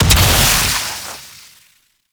Invisibility Proj Explosion; Warframe; The New War; Drifter; Abilities

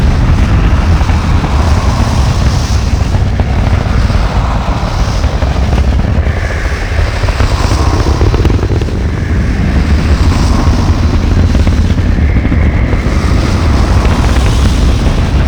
move loop; Murex; Warframe; The New War

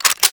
Hand Shotgun Reload; Warframe; Weapons; Corpus

